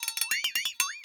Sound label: Monnlight Shake